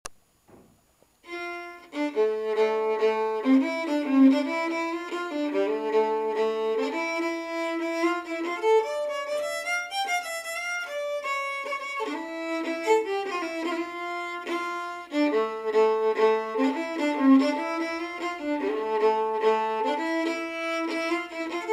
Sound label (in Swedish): stämma